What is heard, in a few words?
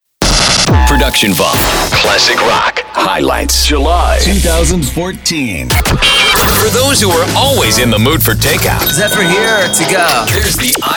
Classic Rock; productionvault